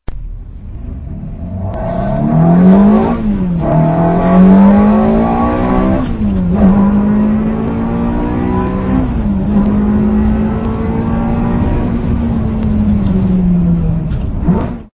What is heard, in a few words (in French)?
Ferrari 360 interieur